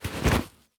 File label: Backpack Medium Polyester Drop; Stereo; Essentials Series NOX SOUND; Sample A Sound Effect